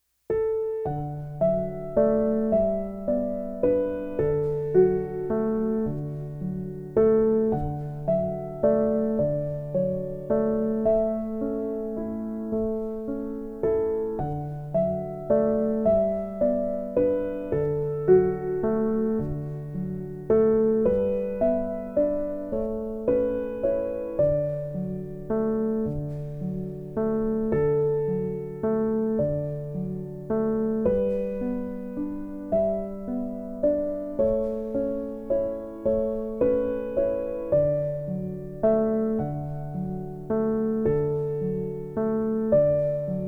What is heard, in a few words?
Piano Version; My Bonnie Is Over The Ocean; Tiny Tunes; Narrative Mind